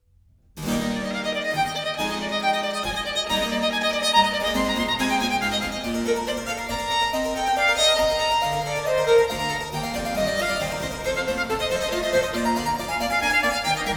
Sonata No. 3 in E Major, Allegro, Violin and Harpsichord; BWV 1016; Bach; J.S. Bach